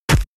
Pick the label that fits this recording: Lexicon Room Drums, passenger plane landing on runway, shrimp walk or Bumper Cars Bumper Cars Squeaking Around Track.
shrimp walk